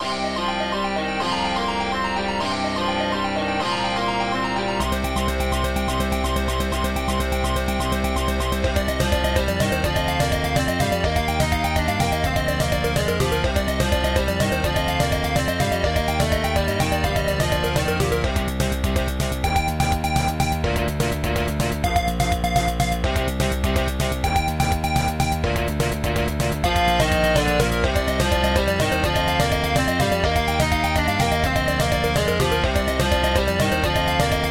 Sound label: bgm